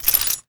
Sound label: rail pins; garrysmod